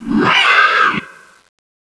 beak scream

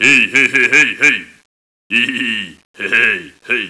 laughter

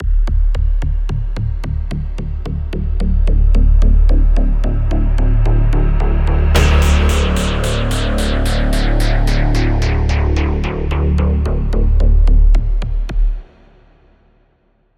checkpoint survival sting

